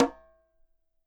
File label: KILLER CONGA